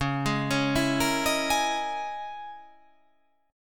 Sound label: C sharp Minor 6th Add 9th C sharp